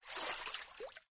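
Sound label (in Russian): холодная морская волна накатывает на берег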